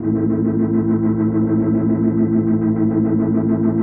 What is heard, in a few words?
DEEPHOUSE ORGAN 125 A